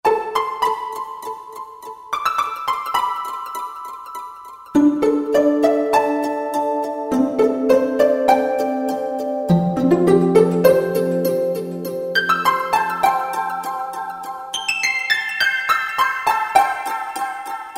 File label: Metal Harp, Roland JD-990; Factory Patches